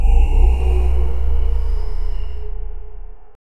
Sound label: a malevolent demons guttu